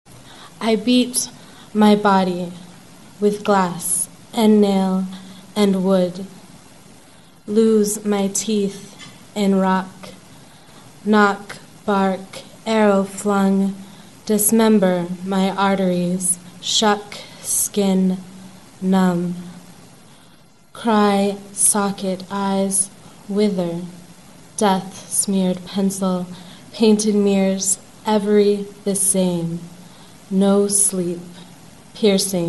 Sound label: BEAT BODY; I beat my body